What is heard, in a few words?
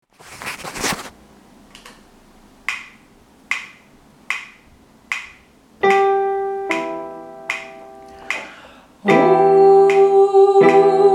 sopran; Hostivařský koncertní sbor